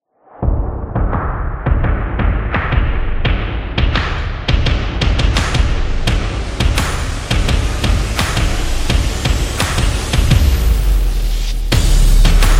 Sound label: Protest, drums trailer